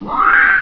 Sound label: GRUNT